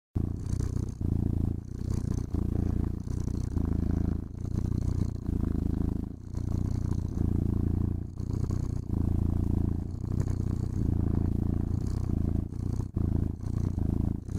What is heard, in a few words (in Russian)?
mur kota